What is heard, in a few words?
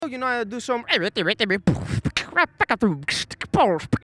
Beatbox; Interview; Beatbox Battle TV